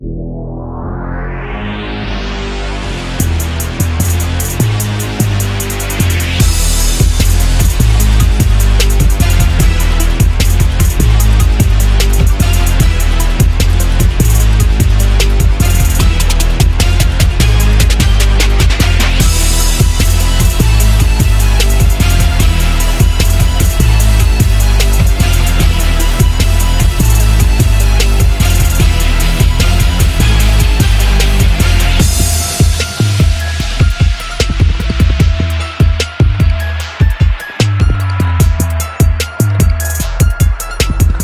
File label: Trap Beat